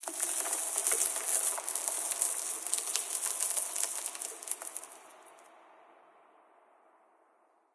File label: amb rock debris, ambient organic; video games; source engine; portal2